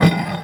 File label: Cast Iron Slide; Gameplay; Awakening Remaster